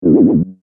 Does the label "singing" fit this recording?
No